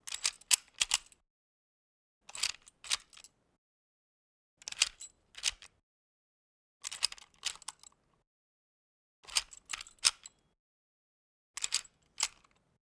Lock outdoor opening; Alexander Ahura; Locks & Keys

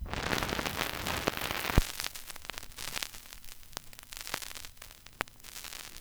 vinyl crackle; 99Sounds; Vinyl Noise SFX

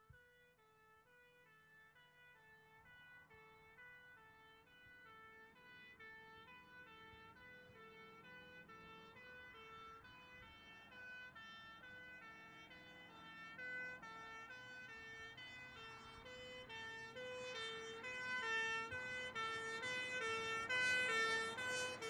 Fire Engine Arrives With Two-Tone; Emergency; BBC Sound Effects Library

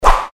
Fighting Swing Sound; FXHome

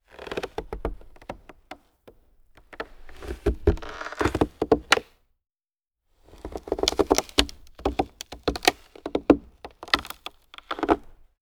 Footstep boot on snow wood step creak stress; SkewSound; Frostbite SFX Library

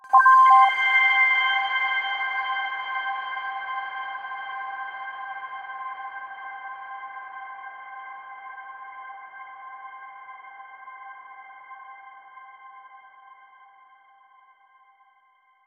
Satellite C, Simply Space

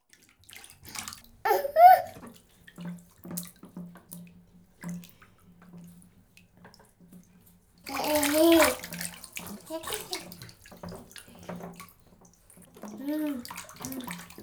Month Old Boy, Bathtime, With Wat, Babies; BBC Sound Effects Library

